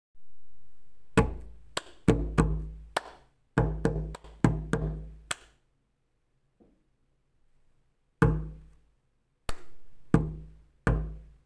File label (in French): Motif de percussion